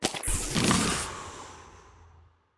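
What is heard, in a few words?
Acid Worm Small Deactivate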